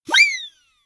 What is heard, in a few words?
notification; samsung Aleart